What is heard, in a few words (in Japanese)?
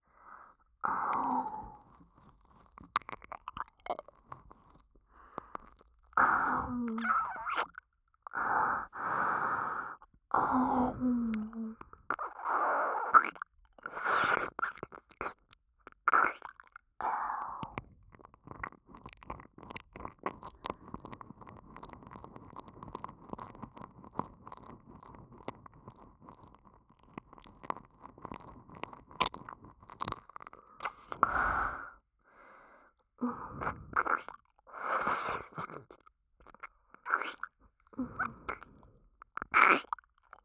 左耳舐め, ASMR